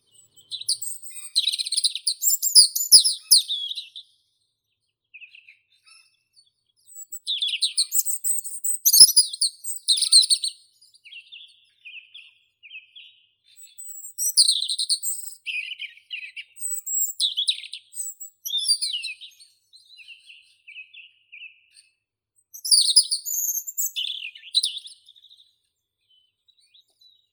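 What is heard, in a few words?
robin Erithacus rubecula, nature sounds; malinovka; zaryanka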